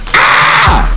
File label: ahhhhh